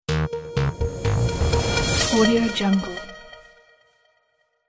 sonic logo

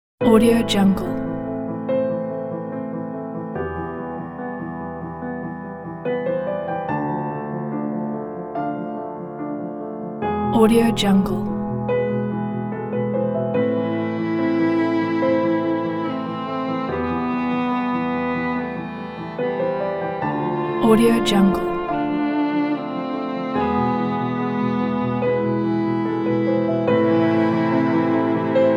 sad emotional background

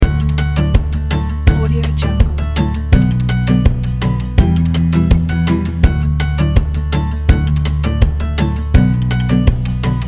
funny positive

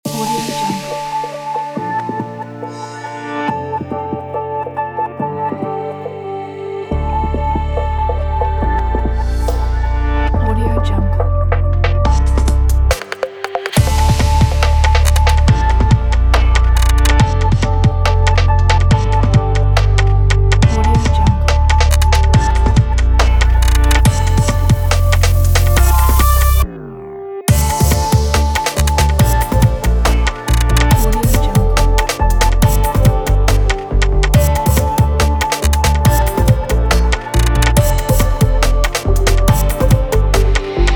abstract technology break

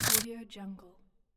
game interface mechanical controls sound

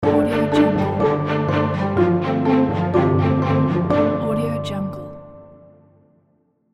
business podcast intro

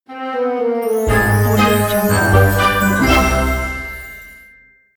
funny cartoon logo

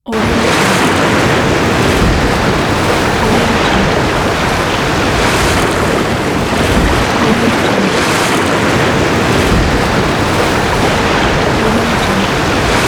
beach ambience

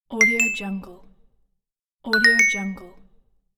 app notification

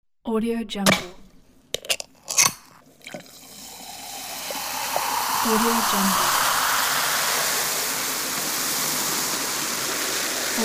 open and pour from tin bank

